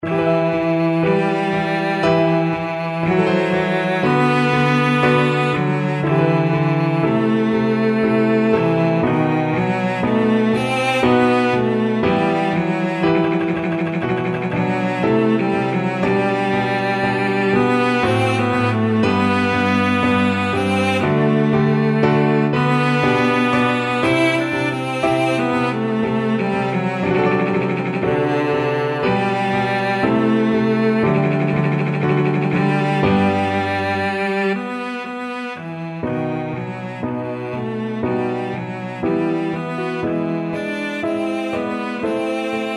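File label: violoncello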